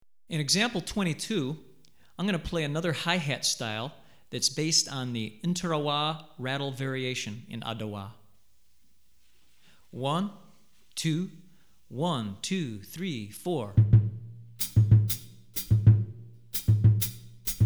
Adowa, Drumset Example, West African Rhythms For Drumset; Hartigan